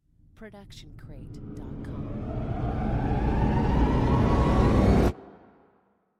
trailer riser; soundscrate

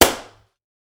Gun Shot Light; SoundsCrate

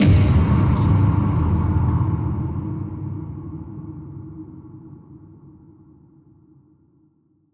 trailer hit ghostly; soundscrate

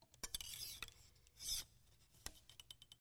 archery foley loading arrow; SoundsCrate

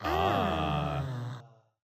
audience aw

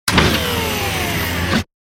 Robotic Movement; SoundsCrate

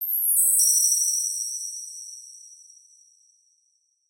fairy dust

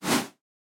air woosh; SoundsCrate

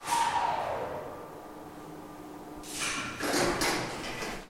elevator mechanism wide

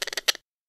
exotic bird sounds

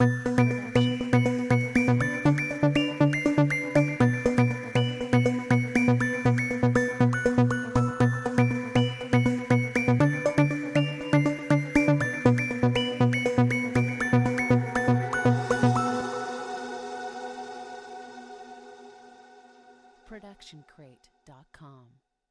News Ident Bells Arp; SoundsCrate

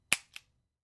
Revolver Trigger Pull; SoundsCrate

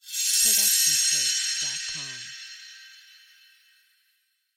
magical sheen; soundscrate

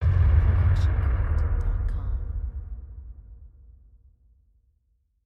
deep explosion

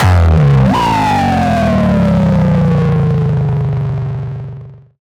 Bass Drop; SoundsCrate

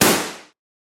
Gun Shot

